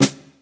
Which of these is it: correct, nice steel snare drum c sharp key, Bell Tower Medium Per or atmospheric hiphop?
nice steel snare drum c sharp key